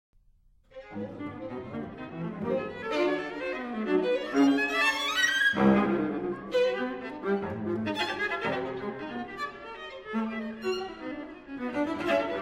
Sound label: Quartet